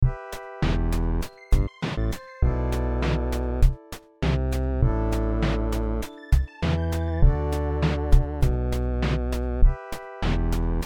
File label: piano chiptune thing